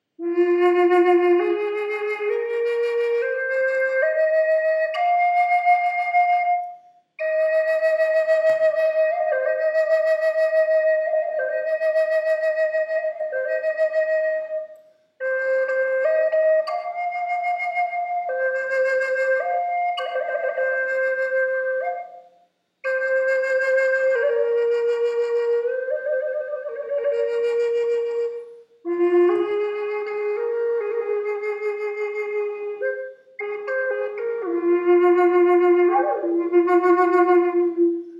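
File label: reverb, flute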